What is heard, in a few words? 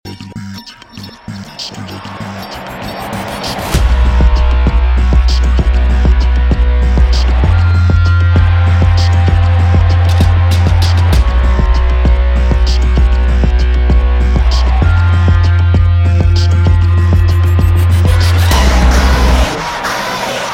Karaoke Version